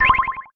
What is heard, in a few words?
menu close